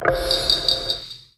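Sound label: attack ancient sword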